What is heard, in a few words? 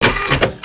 video; skate shop; Tony Hawk's Project 8; XBOX